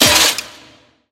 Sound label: metal impact; soundscrate